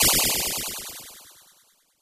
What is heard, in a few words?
jump